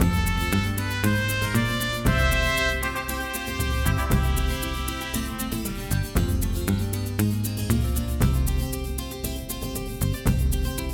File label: Finishlevel; northern tale 2